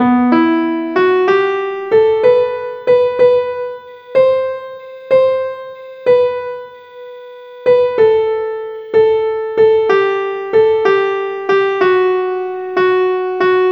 Vltava main theme

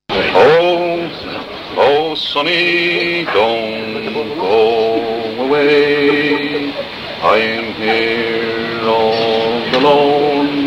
Folk Song; Sidmouth 2001

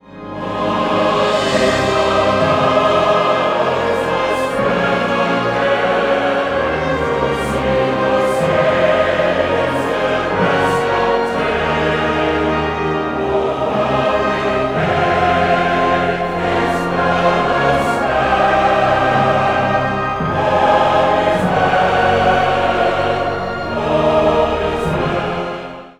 sung by the Tabernacle Choir; Come, Come Ye Saints; Mormon Tabernacle Choir

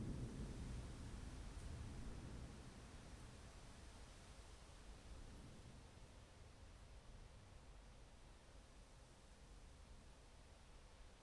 Good thunderstorm

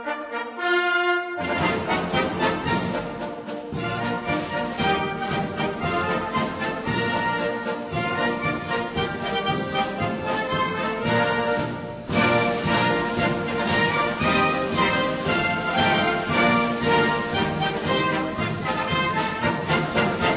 March tune; Wait for the Wagon

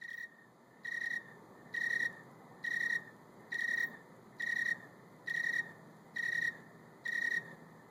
Tree cricket chirping

